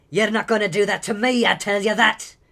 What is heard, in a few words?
Male Reactions